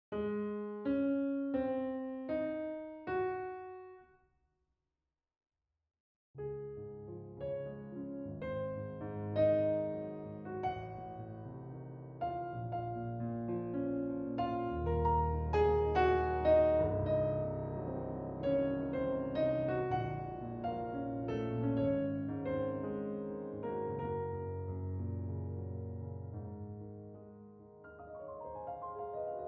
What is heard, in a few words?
improvisation